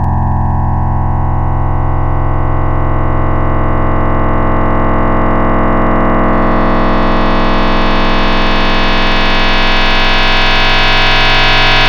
metallic fuzzy bells; Synthetic's Serum Collection; Echo Sound Works Core Tables

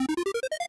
ascending scale squares synth; 'Effection' samples; lolicore

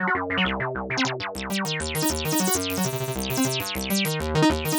acid sequence; 'Go Fuck Yourself' samples; lolicore

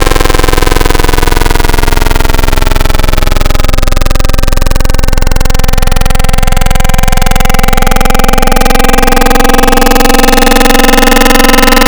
Fm Gime Saw, Xfer Serum Wavetables; Synthetic's Serum Collection; Cymatics - Nuclear for Xfer Serum Wavetables

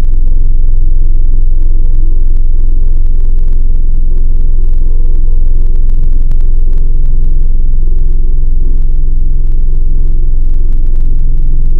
hum tone; Synthetic's Serum Collection; Aubit - 19.75 Vol. 1 Tables

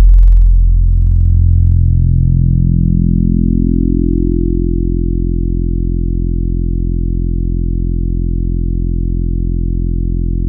Chill Glassy; Keys; Synthetic's Serum Collection; ESW X Keys

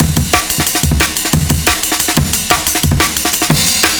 amen EQ compressed, Processed breaks

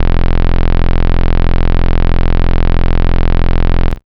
Cut Saw; Synthetic's Serum Collection; Echo Sound Works - Modular

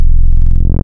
303 Acid

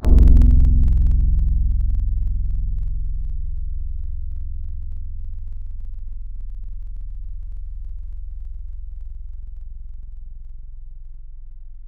Kora; Synthetic's Serum Collection; Echo Sound Works Sphere

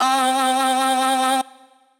Vox C2; Noises; Synthetic's Serum Collection

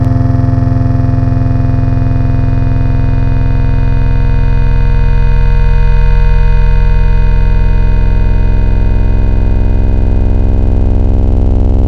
Metallic Chime; Synthetic's Serum Collection; Echo Sound Works Core Tables

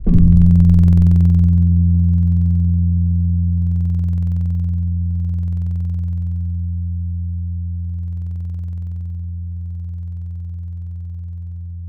Keys Filtered Bell; Synthetic's Serum Collection; ESW X Keys

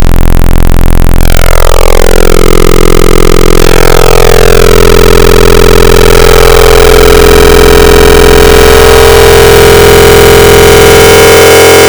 Square Harmonics; Synthetic's Serum Collection; Cymatics - Virus Wavetables; FM Wavetables

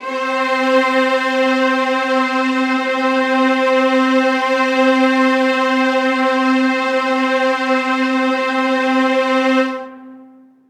Legato Oct C4; Synthetic's Serum Collection; Aubit; Louv Vol. 1

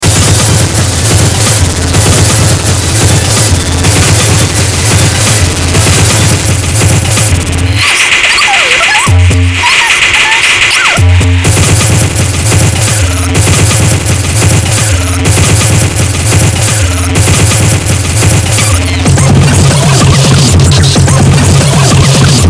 600bpm mix

